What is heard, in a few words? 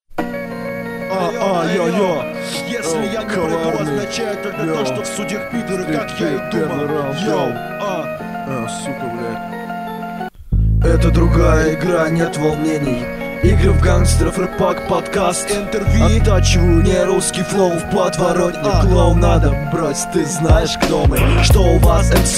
drum street beat